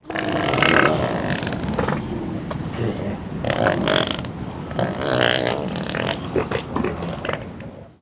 Seal Elephant Growl

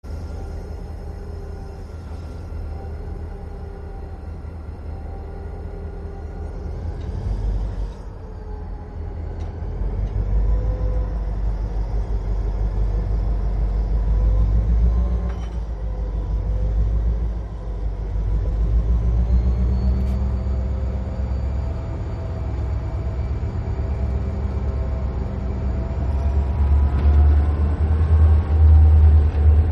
Train Freight Train, freight trains

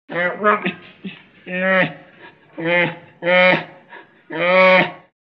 Bear Unknown Type

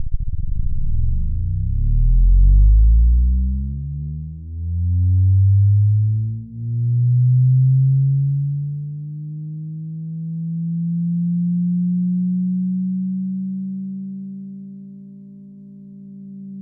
engine rev up down; engines

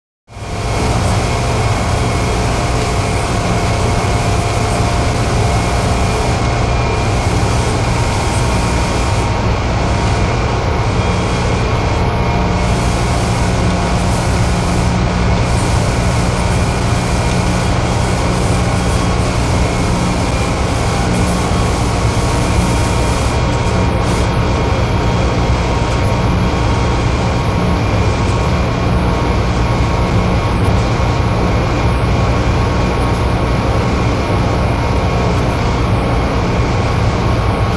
Drying Cylinder On Ro, factory

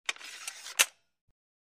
Auto Magnifying Glas

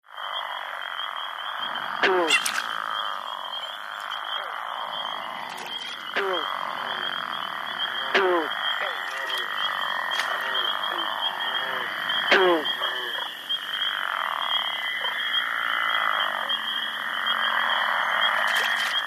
Swamp Bullfrog Dis

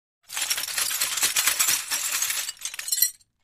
Glass Shake

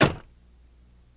Ambulance Door Close, doors

